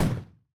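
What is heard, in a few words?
explosion hit; AssaultCube; Alien Stars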